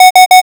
alarm

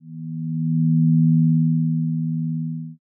zero g

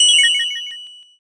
ice magic

